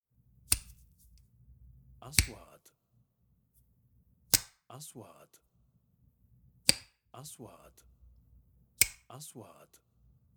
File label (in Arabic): زرادية; sound effect